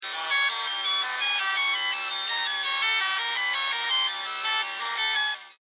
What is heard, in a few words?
Start-Up Melody Faulty, Robots; CraftedSignals